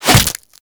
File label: Barb Hit Default; Warframe; Desert Skate; Hit Effects